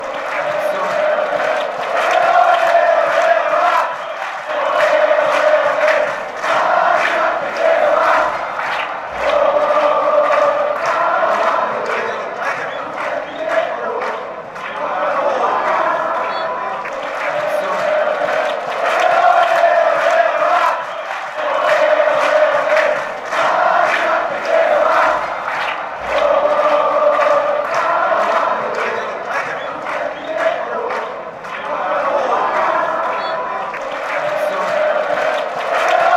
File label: ole Paraguay, chants